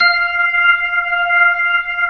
B-3 Jazz; Keyboards of The 60's and 70's

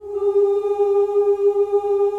A3 FEM CHOIR; CHOIR OOS

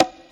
Percussion, House

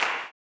CLAP, Blues Soul; Spectrasonics Vocal Planet

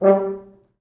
Fr Horns stac

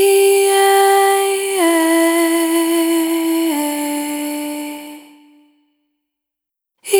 Single Voice Melodic Loops; Sony Creative Software; Pocket Diva World Class Vocal Samples; Discovery

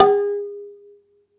Rubbery Piano, prepared piano; Total Piano

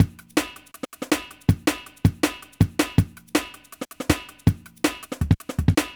drum, Drum & Bass; Best Service ProSamples vol.03 - Drum & Bass 1; AKAI

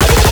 Pluck, bass

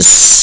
hat blaster; PlatinumPhattCD1; E-MU Producer Series Vol. 8 – Platinum Phatt; Mo Phatt

